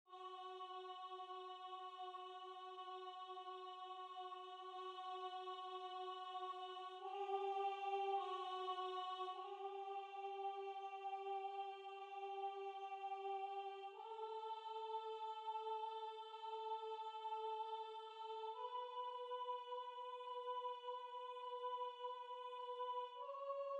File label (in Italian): Soprano